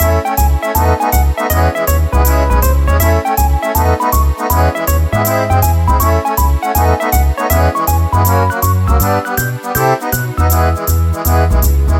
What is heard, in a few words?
Cartoon Game Theme Loop